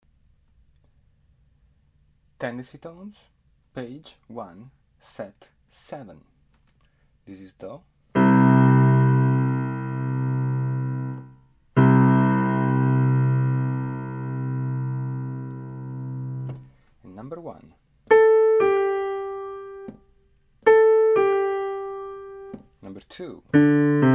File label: tendency tones